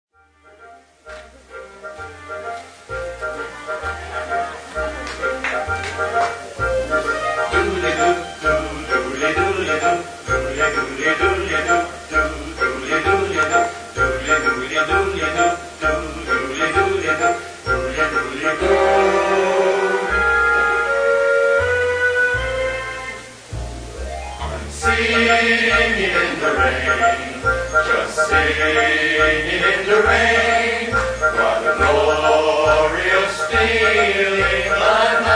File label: Singing